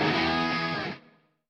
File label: guitar